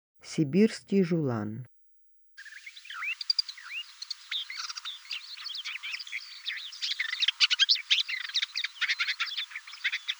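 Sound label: brown shrike voice; zhulan sibirskiy golos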